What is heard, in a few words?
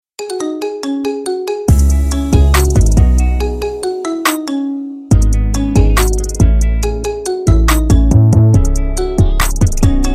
marimba remix